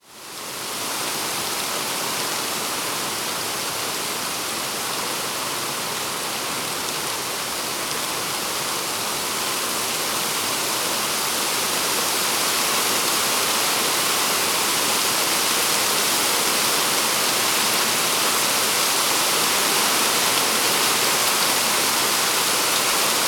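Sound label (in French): Pluie, Paysage sonore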